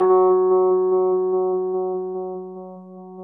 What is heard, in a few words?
Chor Rhodes, F#; E-MU Producer Series Vol. 5 – 3-D Audio Collection; 3DRhodes; 3DChorRhodes1.5